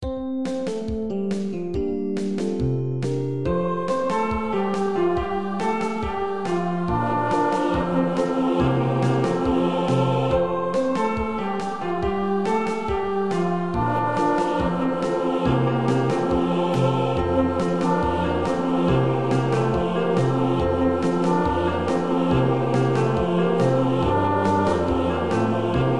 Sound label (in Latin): gloria alto fast; paulusfest